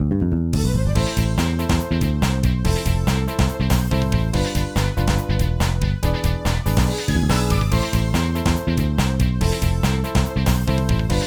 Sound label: Pop Stage Karaoke; Pinky Street Kira Kira Music Hour Pop Princess; Pop Stage 2